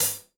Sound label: Gretsch Hi-Hat Closed